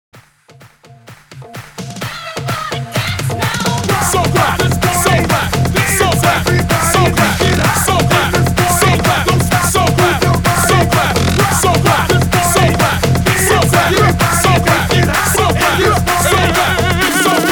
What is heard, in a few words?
128 BPM